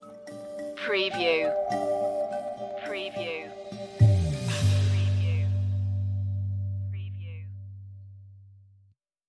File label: safari lite stinger